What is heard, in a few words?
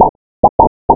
POPS; Realmz